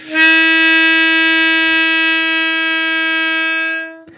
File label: harmonica note; Harmonica Multisampled